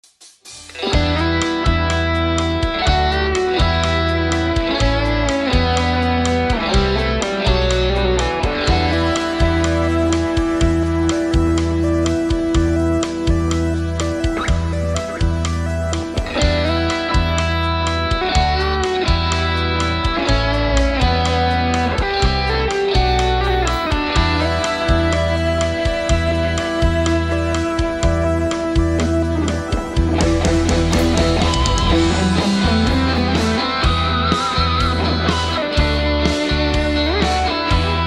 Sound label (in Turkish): elektro gitar cover